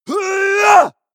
male combat attack long

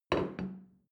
plate place table soft short, gastronomie; berufe; soundpool

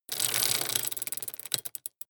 metal chain rattle

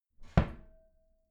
wooden door close regular glass clang

